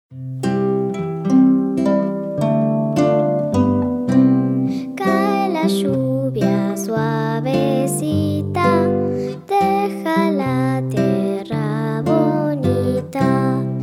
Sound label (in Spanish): cae la lluvia